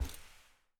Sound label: crouch slide